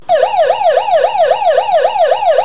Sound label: old yelp, navy alarm; Surface ship & Submarine - E3D2 Electronic Siren (Multiple purpose IC/SM switchboard indicating a power failure)